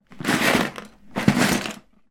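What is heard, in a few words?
leisure lego box small movements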